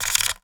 Polystyrene Squeak

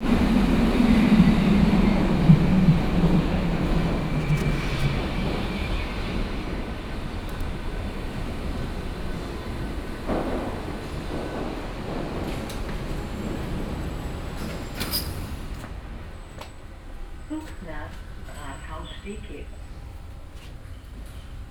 U Bahn Berlin; Sound Scapes

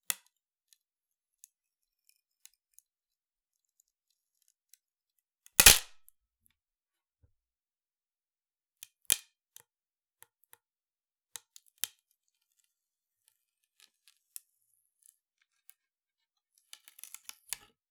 Palette, WoodenPalette

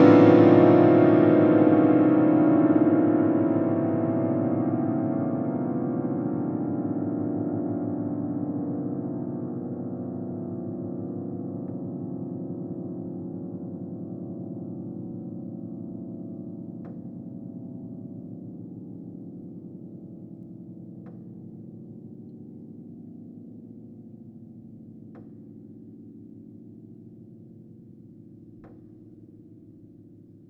String Brush No Att, Piano; Piano Miscellaneous

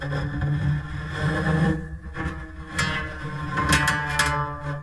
String Scrape, Piano; Piano Miscellaneous

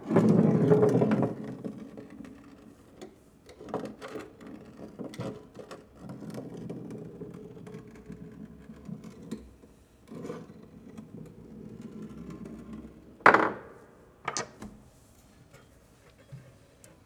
Marble Sparse Roll, Piano; Piano Destruction

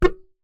Melodica Short Note

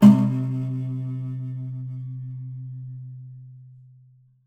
Classical Guitar Buzz Pluck; Classical Guitar Extended Technique Stereo

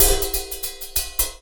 Cymbal; Percussion Samples

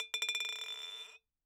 Bottle Struck

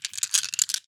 Marbles Rattle

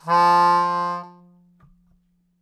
Melodica Long, Long Notes Chromatic

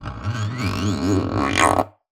Classical Guitar Extended Technique

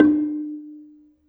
Gambang Soft Beater, Gamelan

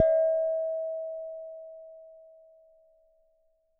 Struck Vase

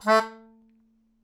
Melodica Short; Short Notes Chromatic

